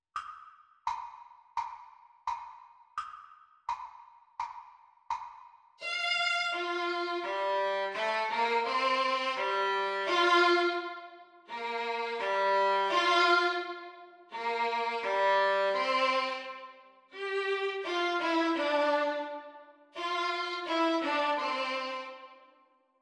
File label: Violin Duet Song Exam Speed